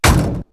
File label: Metal Impact, perc fx; X-Static Goldmine